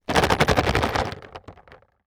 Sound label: Concrete Pieces Plastic Tub Rattle Fast Rattle; Foley